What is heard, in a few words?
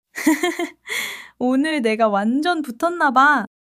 laughter